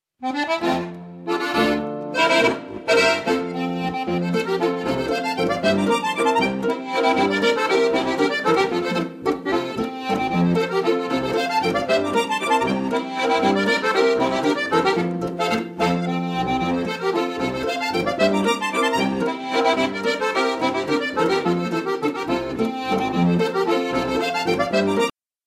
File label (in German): Musikanten Landler